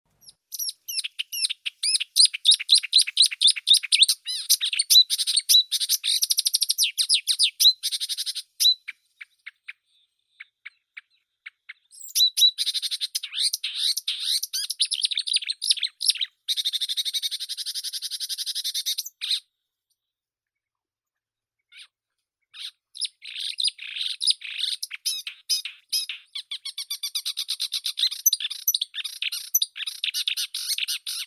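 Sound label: marsh warbler, Acrocephalus palustris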